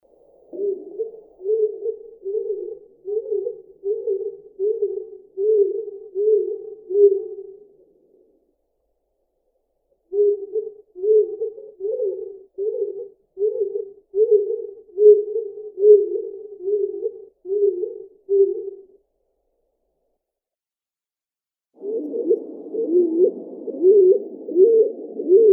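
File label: stock dove, Columba oenas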